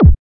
gliss down, Kicks; single hits

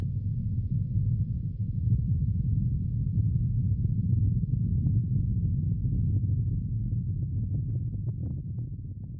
Ominous Rumble

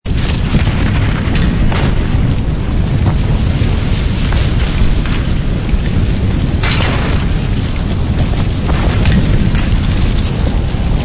cathedral crumble; Sound FX Real Life

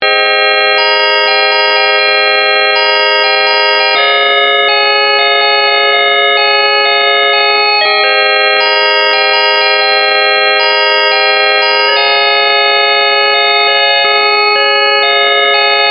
homemade synth melody

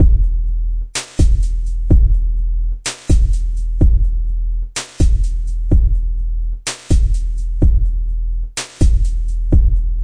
126 bpm simple break kick hats

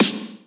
snare springy gate; single hits

